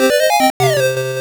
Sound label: lose jingle; Unten Thomas Deluxe Set; Pico gamerip 2000